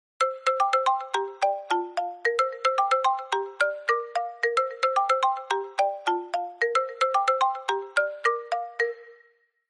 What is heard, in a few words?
samsung notification samsung s22 ultra